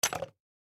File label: Knife; MiSide